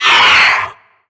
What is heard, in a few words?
pain, headcrab reviver; creatures; vox